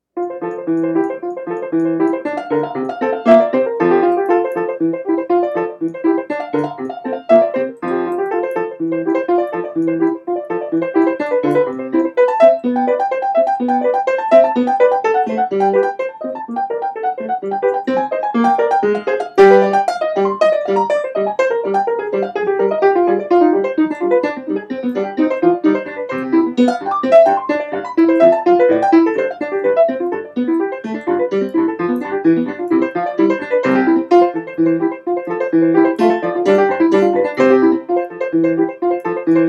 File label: improv F maj, piano improvs; F major; Toccata à la Scarlatti (F major, 1:59); Noam D. Elkies piano improvisations